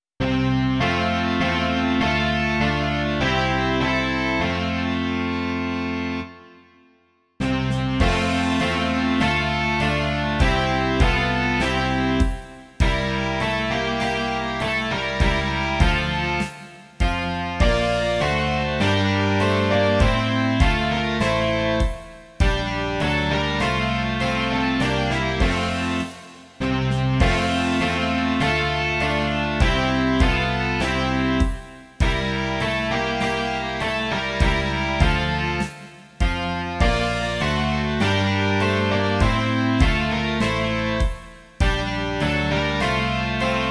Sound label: rock